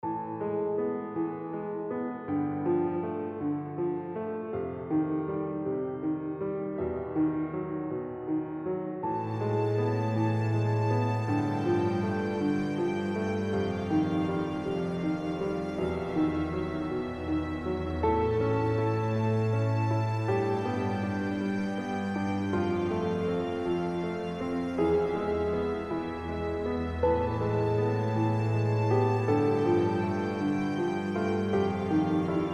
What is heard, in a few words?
piano strings; all angels